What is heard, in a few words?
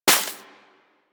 Bullet Impact Debris Snow